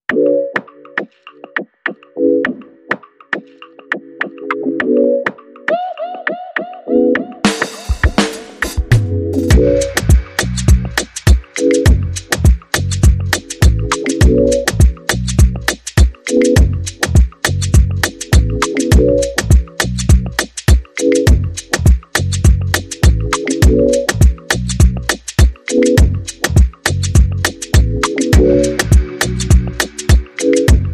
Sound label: Funky